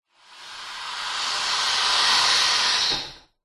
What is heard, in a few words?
industrial pump air release hiss; zapsplat